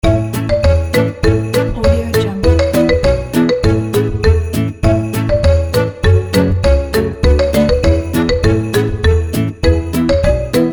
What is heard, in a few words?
marimba deck the halls ident